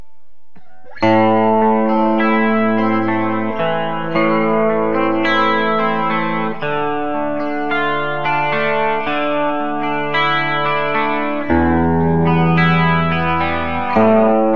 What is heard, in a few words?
bridge clean; Burny